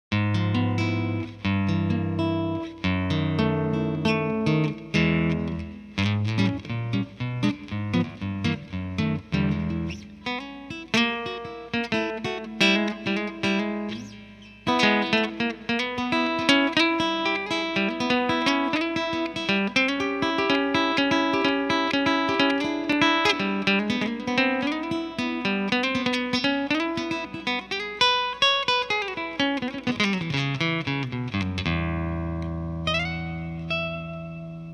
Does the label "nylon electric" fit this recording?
Yes